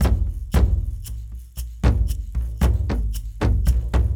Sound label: Kendong, World Percussion; Brekete, Shaker